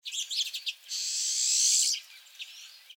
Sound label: Pine Siskin song